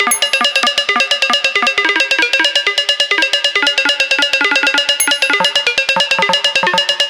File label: Synth 135BPM Am Melodic Glitch